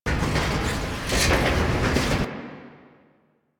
Glyph Puzzle Coop Locker Rattle Loop; Warframe; Duviri

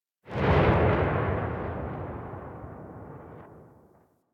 Sniper Rifle Alt Tail; Warframe; Weapons; Corpus

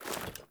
Foley Run Default, Foley Default Clothing; Characters; Player

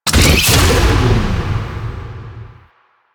Nitro Ability Start, Motorcycle; Motorcycle Ninety Nine

